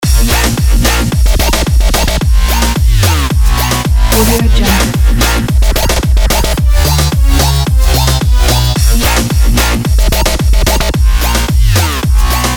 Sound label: edm moombahton loop